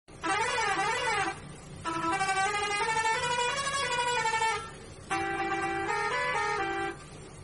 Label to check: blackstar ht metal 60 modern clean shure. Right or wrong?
Wrong